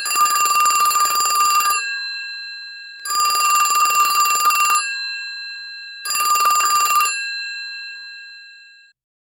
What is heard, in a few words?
1950s phone ringing